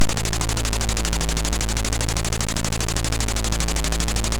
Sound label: Colony, MOS6581